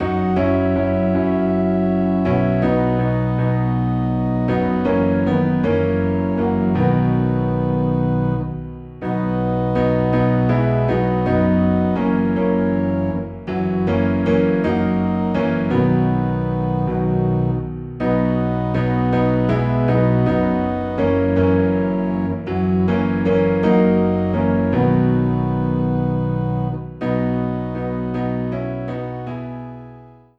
Piano and Organ Low in B

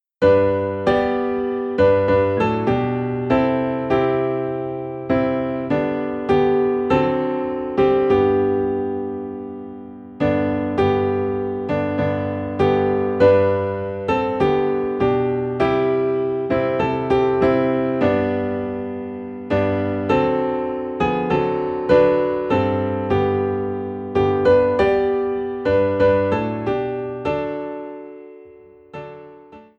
Piano in G

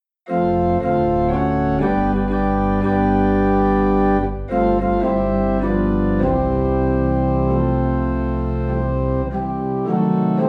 Organ Low in C

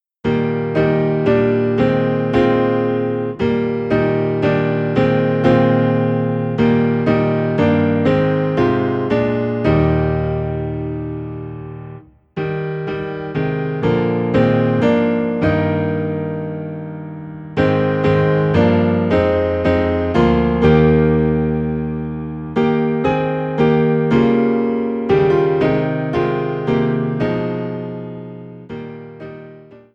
Piano Low in E Flat, hymns

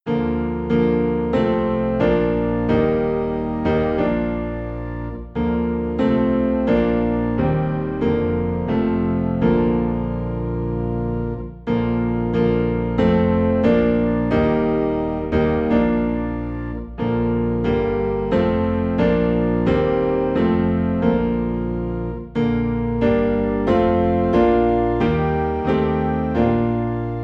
piano and organ low in B flat; We Believe In One True God

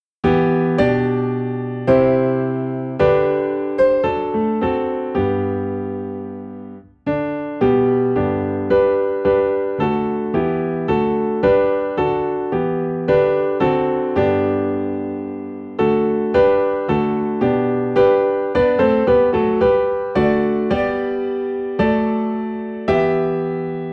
Piano in G; All Hail the Power

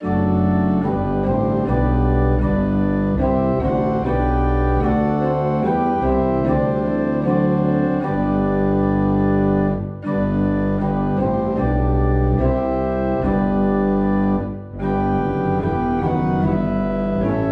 Organ Low in G; A Charge to Keep I Have

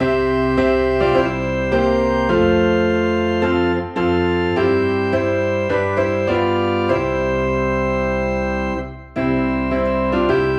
Piano and Organ in C; Tell me the Old Old Story

